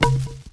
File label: grass; Mafie 1